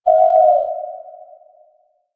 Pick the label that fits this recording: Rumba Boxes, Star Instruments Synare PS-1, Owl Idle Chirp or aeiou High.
Owl Idle Chirp